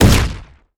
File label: explosion rocket